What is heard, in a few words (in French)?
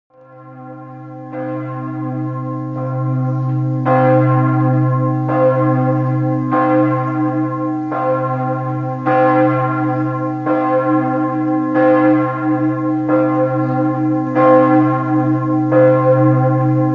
ambiance cloche